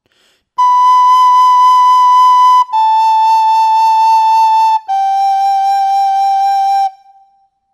vibra sopro, Pito Galego; afinacion

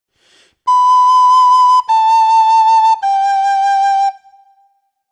vibra sopro, Pito Galego; afinacion

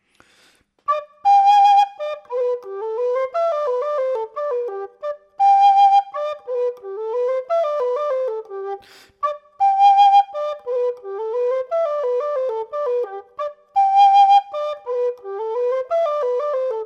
son pito alto, Pito Galego

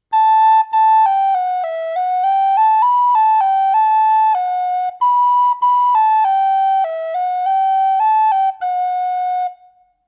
ligado, Pito Galego; articulacion

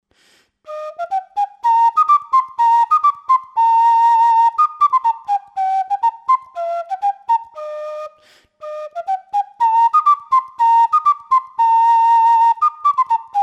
son pito cana, Pito Galego

